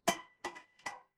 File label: glass ceramic